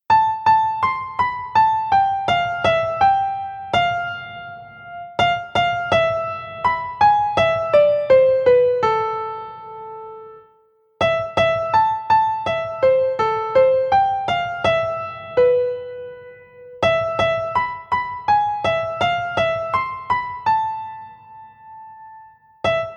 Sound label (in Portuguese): TIMBRE (Piano)